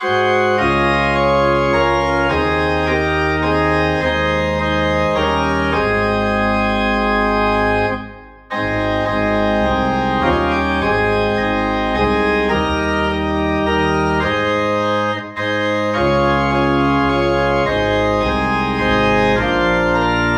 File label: Organ in A Flat